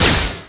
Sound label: Zap